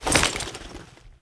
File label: Foley Step; Warframe; Kahl